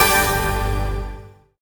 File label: free spin start; Amatic; All Ways Win